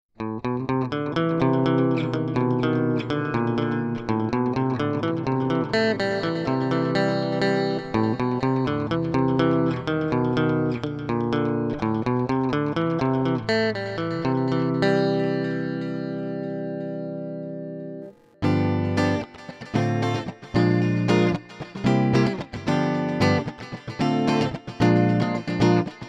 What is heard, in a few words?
Guitar - Vintagedelay